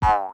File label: Ribbit Frog Jump; creatures organic